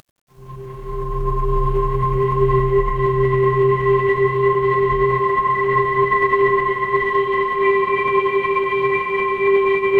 ambience forest

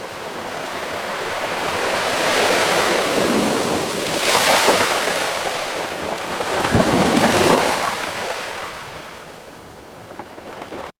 ambience ocean

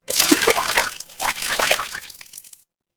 water splash

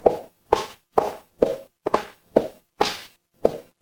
footsteps heels

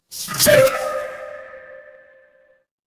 feedback start

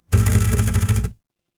office phone vibrate

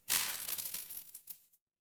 feedback celebration

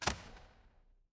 door close

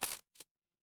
footsteps single

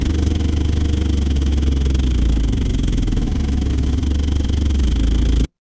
mechanical engine

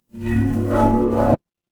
magic scifi alien

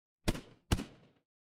impact gunshot

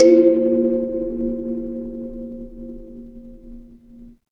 instrument kalimba

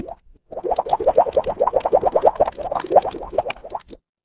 water bubble

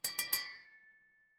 glass clink

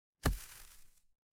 retro game hit

